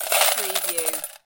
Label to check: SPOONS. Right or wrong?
Wrong